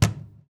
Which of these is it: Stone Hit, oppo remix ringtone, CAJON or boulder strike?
CAJON